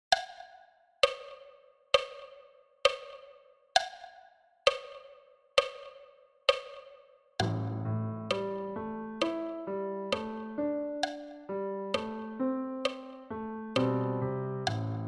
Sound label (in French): timbales piano solo a 66 bpm